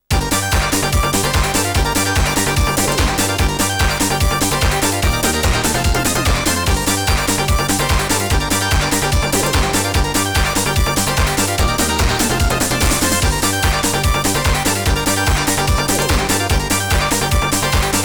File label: Battle